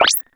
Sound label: kwerk, machine perc; Zero-G Ambient 1